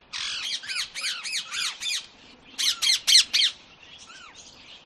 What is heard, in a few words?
urban farm jumbo quail and background birds